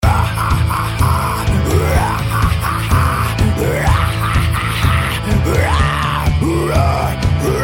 Metal Laugh

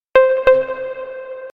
Nice and small sms

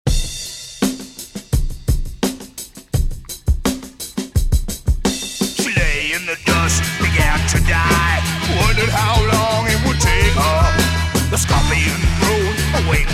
solid rock

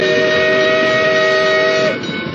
Steam engine Whistle

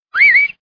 whistle message; ringtones; sms

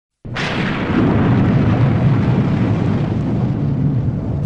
thunder sms; ringtones

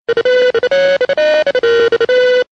Red Phone

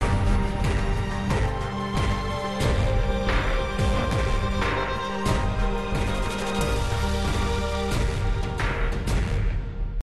Epic Rock

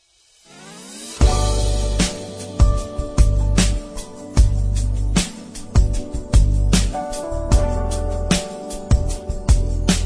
american smooth jazz

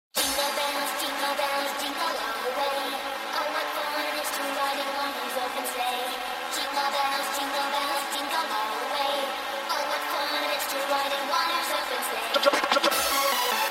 anime jingle mix

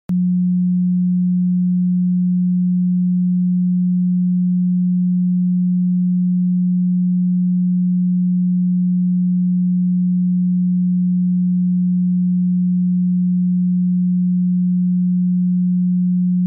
80Hz, ringtone

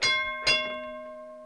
ding trolley

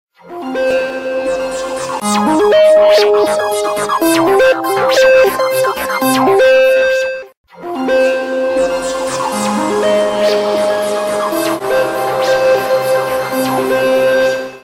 htc 3d sms; ringtones

